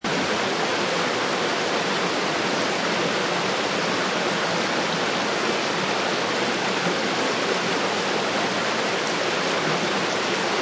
Large River Loop, A medium to hard river flowing evenly; Perspective approx. 10 ft from water, good stereo definition. Seamless loop; Forest; Lynne Music